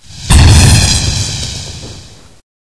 shock discharge

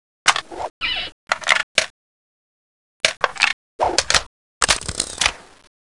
reload empty; poke646

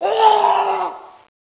body scream; russian sounds

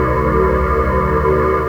teleport rift

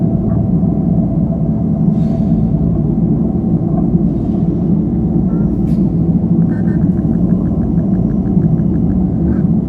spaceship ambience

